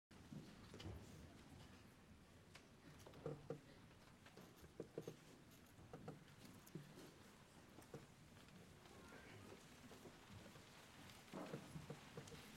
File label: Mixed Choir -; 2003 District II; Cry Out and Shout (Knut Nystedt)